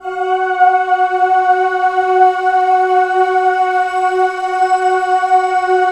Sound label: Dream Voice Pad, F#; E-MU Producer Series Vol. 5 – 3-D Audio Collection; 3DSynths; 3DreamVoicePad